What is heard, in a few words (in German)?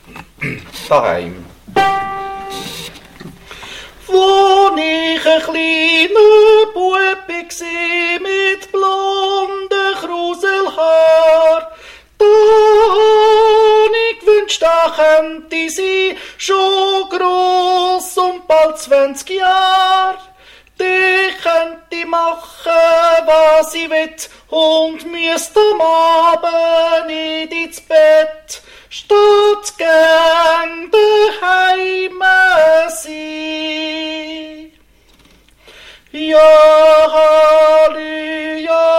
2. Tenor; 1. Tenor